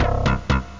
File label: mirage bass trio; OctaMED SoundStudio V1